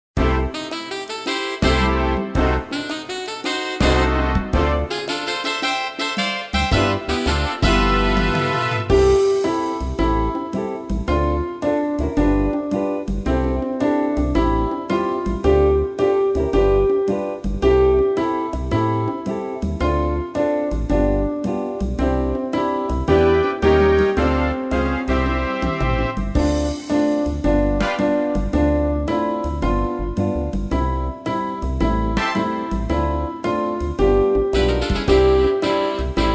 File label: Keyboard jogging, piano; pistas-piano